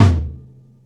Tick Tack Tom; Percs

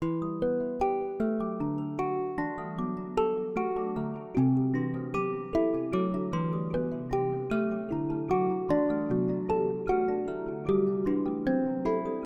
76BPM; BWB X SUPA 2

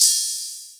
Open Hat

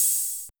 Hat Open

